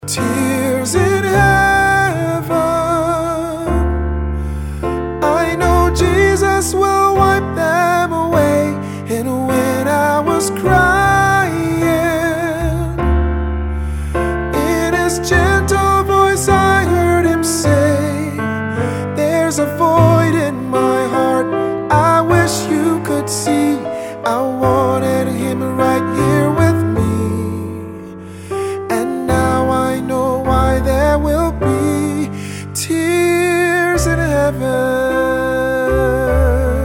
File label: ring tone